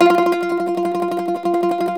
MANDOLIN, Tremol Mandolin; StTremolMandolin

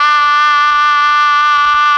OBOE; ILIO; Propeller Island Legendary M400; BRASS+OBOE

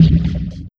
Bumpers, Fx; Sonic Foundry (Sony Creative Software); Esoterik Beatz; Bleuy

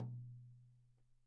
GTom, E-MU PX-7

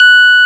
PUNCHREEDS; Wizoo - Powered DX

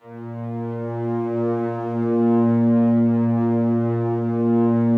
slow strings A#1, Yamaha VP-1; 90 s Sample CDs; Music Store

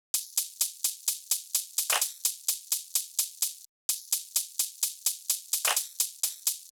Drum Loop 128BPM Shaker; VEC5 Drumloops; VEC5 Multi Loops 128BPM; Loop Elements

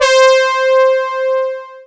SYN, analog

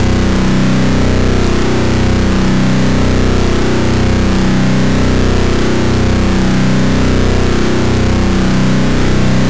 FUZZ BS, LOWLIFES; Spectrasonic Distorted Reality 2

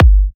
G, Kicks; markus hakala

